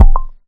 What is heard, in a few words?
item pick